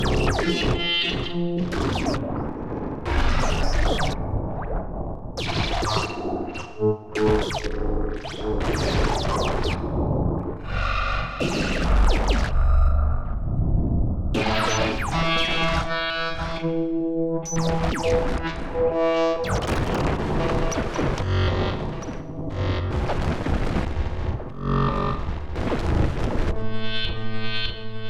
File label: feedback noise